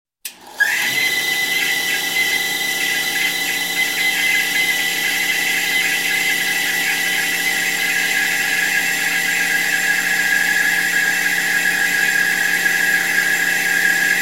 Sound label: Motorized Shop Tool Shaper Turn On Steady With Intermit; Traffic, Sirens, motors